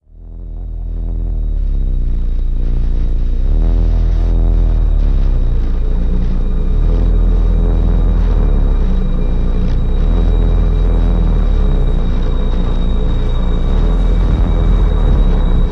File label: Psych Drone Begin; katana zero